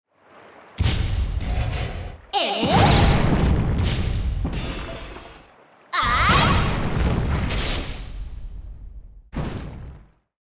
Appear

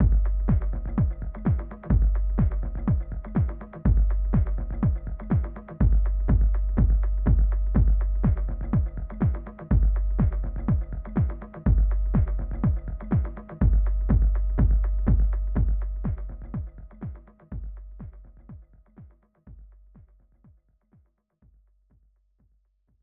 Do the Techno Dance!; Emmitt Smith Football; SNES

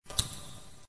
Start

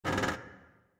Creak Metal Medium short; Puddle; puddle plus ios gamerip 2018

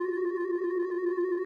Claw Shifting

